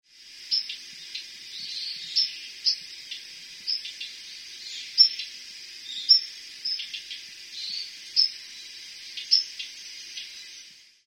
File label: jobitaki; ジョウビタキ; 地鳴き